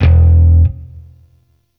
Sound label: Shawzin Oct One E; Warframe; Sentient Shawzin